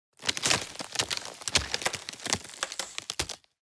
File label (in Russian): tresk lda